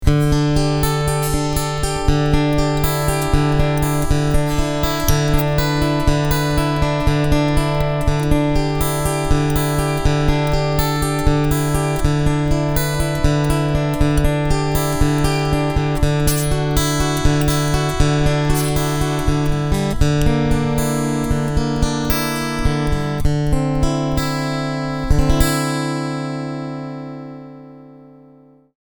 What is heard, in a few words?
Picking All Full